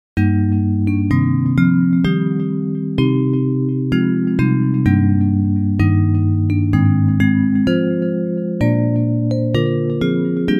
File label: Bells; Hymns